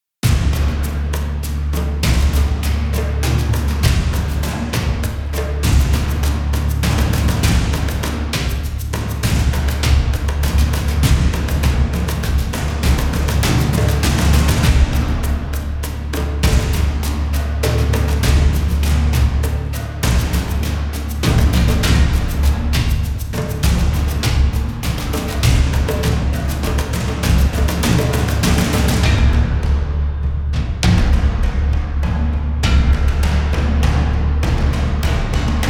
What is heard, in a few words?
Sewers Combat; The Witcher 3 Wild Hunt; Novigrad